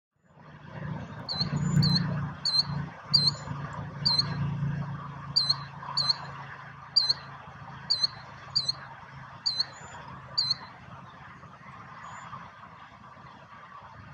Killdeer call song; usa; the noisy neighbor of the bird world

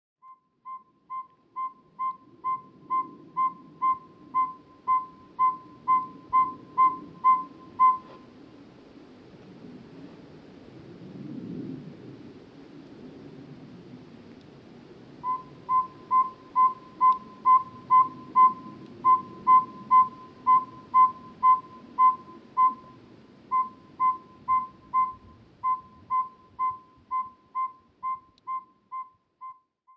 Northern Saw whet Owl Call; usa; tiny night hunter

